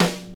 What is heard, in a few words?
sharp acoustic snare sound F sharp key, Royality free snare drum tuned to the F# note